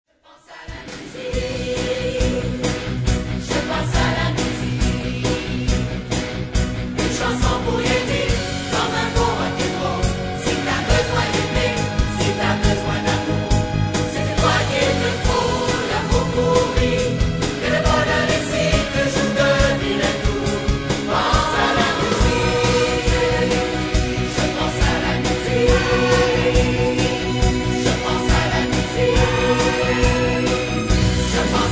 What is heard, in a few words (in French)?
Pense a la musique SATB